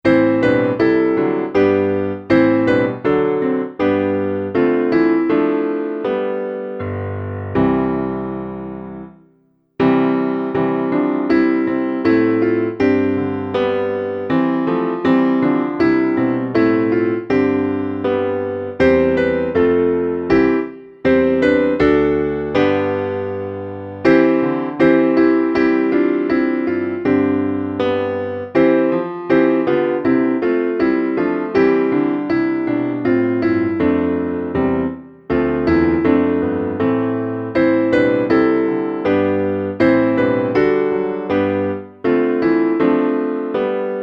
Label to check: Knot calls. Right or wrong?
Wrong